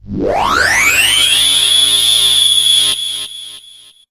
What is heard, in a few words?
beam